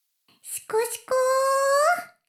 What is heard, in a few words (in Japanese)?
シコシコ音声; 古き城のドラゴニート2